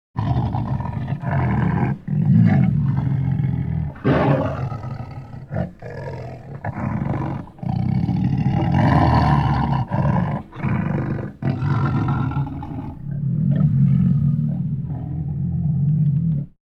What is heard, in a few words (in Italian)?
Leone, olofonia